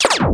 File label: fire gun